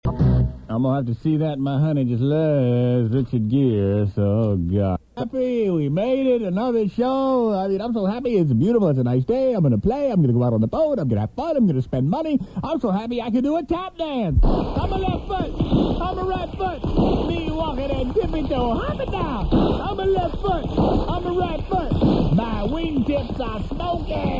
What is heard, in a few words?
Tap dance